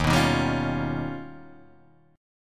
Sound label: D sharp Augmented 9th D sharp